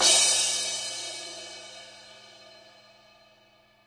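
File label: 18 inch crash, cymbals; Crashes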